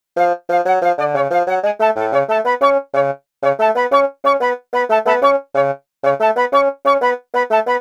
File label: Horns; Sonic Foundry (Sony Creative Software); Cinematic Funk Urban Tone Poems